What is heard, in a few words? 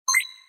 iDroid Blip; MGSV